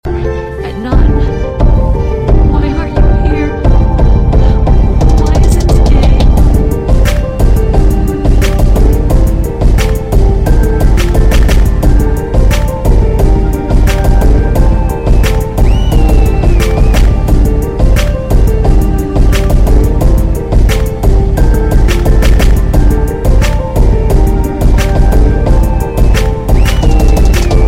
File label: Remix; Silent Hill